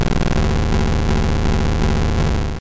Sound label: Fire Spin; attack moves; pokemon; gen 2; gsc